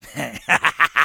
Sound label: laugh evil, fr